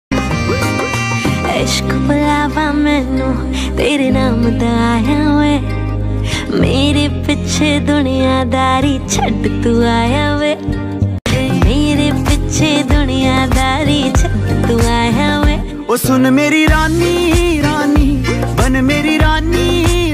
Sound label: Female ringtone